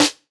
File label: Snare, jungle breaks drum sounds